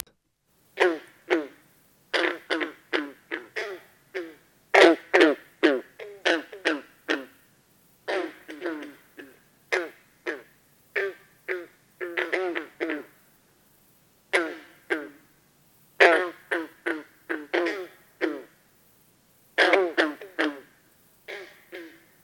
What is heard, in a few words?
Green Frog Rana Clamitans